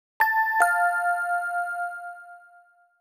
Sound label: chime